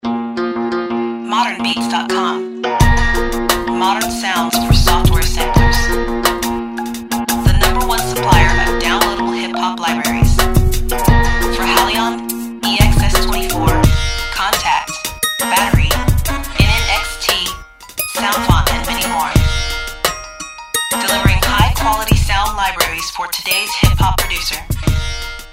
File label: Ethnic Hip Hop